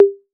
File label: TOMS 808; Wizoo - Platinum 24 Electronic; CHR TOMS 808